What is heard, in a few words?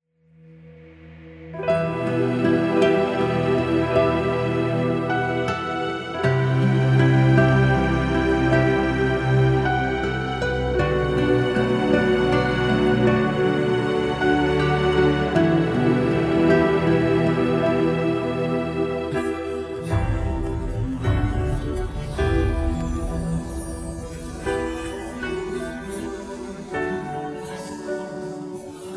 Karaoke